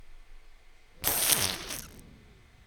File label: wet fart